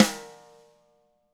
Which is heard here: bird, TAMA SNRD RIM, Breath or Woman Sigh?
TAMA SNRD RIM